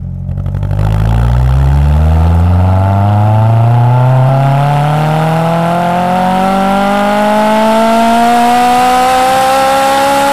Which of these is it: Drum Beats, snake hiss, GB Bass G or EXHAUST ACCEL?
EXHAUST ACCEL